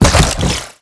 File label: Drone Missile Attack Start; blue archive